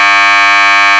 bullhorn, sirens, canada

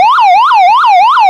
yelp, sirens; code 3 h2

